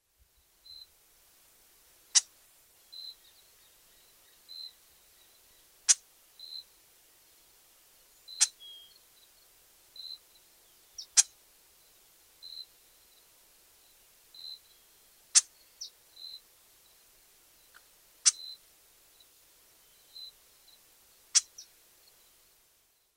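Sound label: doradito oliváceo pseudocolopteryx acutipennis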